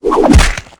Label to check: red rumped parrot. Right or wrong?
Wrong